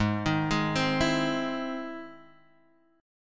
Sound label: E Augmented Major 7th